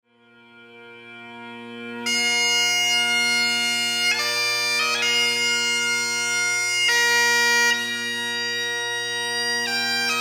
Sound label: pipes